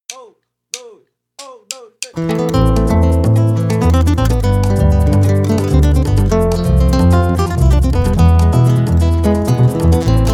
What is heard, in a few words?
guitar